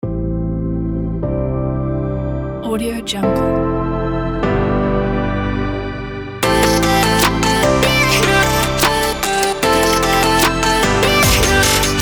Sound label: chill trap r&b